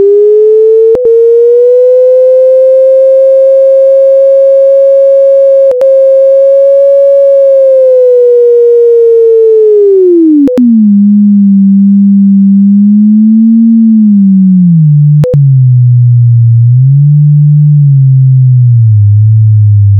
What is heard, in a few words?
Kieserite Sulfate audio ticks; Gale; Mineral; PLANETS; Science Activity Mineral Fingerprints Minerals We Notice